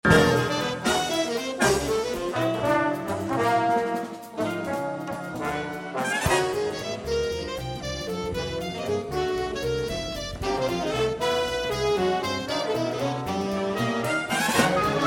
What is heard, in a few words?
Concerto For Jazz Band et Orch; RolfLiebermann